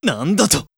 voice damage

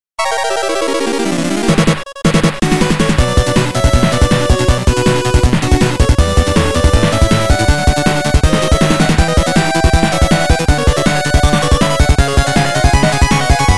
8bit Battle

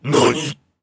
monster damage, voice

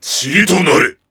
monster attack

